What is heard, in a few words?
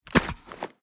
g36c mag; daffas arsenal; tacz